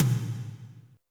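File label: tom, real mega drums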